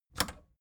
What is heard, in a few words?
record player power click on fast